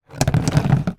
foley stones pebbles movement tip in small cardboard box